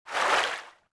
water wade, footsteps

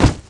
Large Spear Thud, Aliens

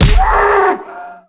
hit cow; animal; Tony Hawk's Project 8; XBOX

